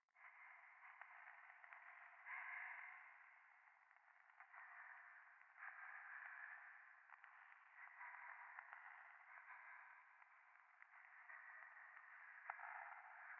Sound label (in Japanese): 左耳快感吐息 暖炉